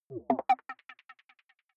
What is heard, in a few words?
button echo wobble bright fast; signale